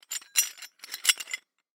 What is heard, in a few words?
Hole Saw Rattle, Hole Saw Bits